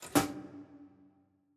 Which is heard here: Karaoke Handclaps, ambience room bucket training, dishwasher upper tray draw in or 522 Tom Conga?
ambience room bucket training